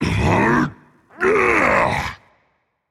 grunt lift rage; chaac

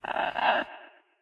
phoenix base chirp; smite; npc

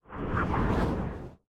float; smite; thanatos

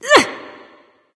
grunt hit; smite; kali

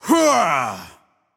battlecry; smite; thanatos

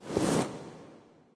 armor; smite; poseidon

